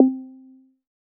Bounce

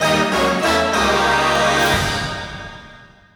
3rd Place Goal Fanfare; crash bandicoot series sound box

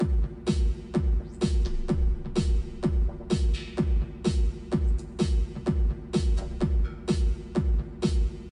Loading Music; windows

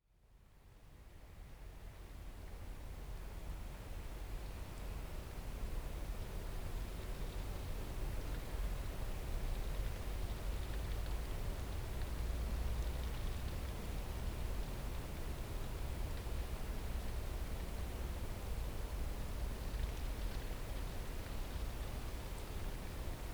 amb generic outside night; Lucius III; pc linux

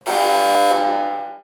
alarm sound

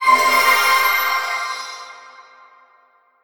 UI In Game Level Up; Faery Legends of Avalon

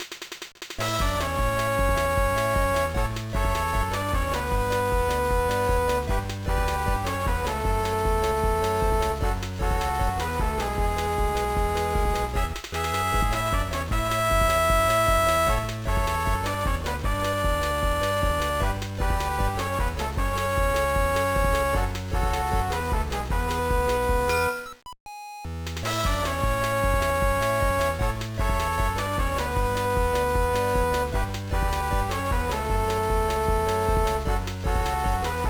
BGM; Zettai Zetsumei Dangerous Jiisan Tsuu Ikari no Oshioki Blues; gba

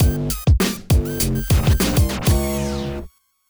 Mission Failed; Gangstar West Coast Hustle; Android

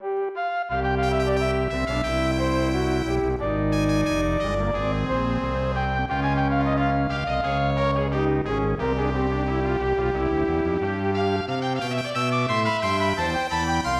Orchestra Concert; lennus 2; snes

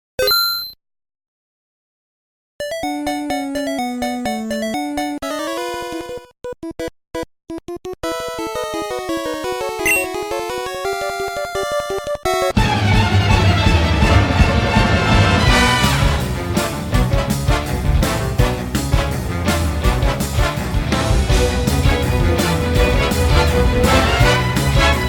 Rock Jazz Orchestra Version; Pokemon Trainer Battle

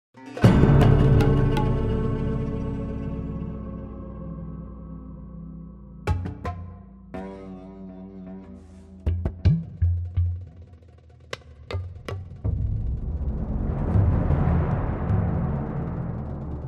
Loop Stealth

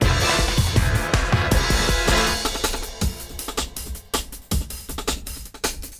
Combat END; bolt 2008 pc gamerip

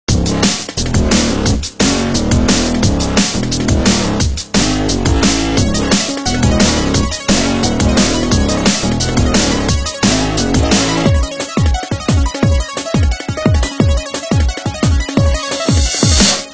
Battle Intro; deadmans cross ios 2014

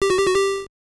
SFX Pause; Ice Climber

